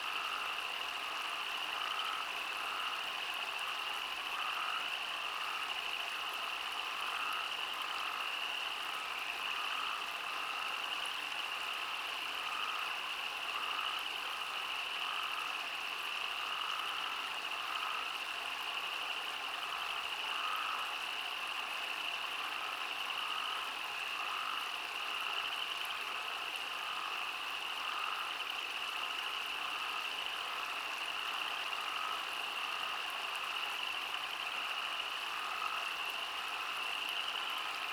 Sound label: Croaking Creeks; Naturaleza Sonidos